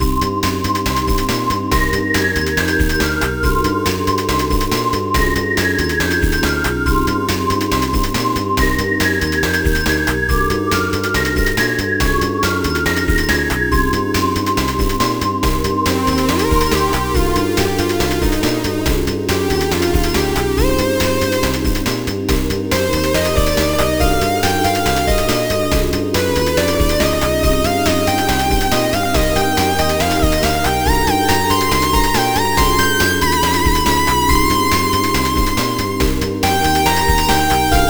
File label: theme; ld58 collector